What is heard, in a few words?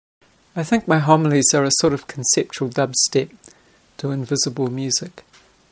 dubstep 100